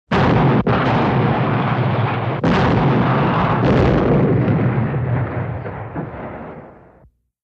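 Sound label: Cannon Fire Quick Round, modern artillery exterior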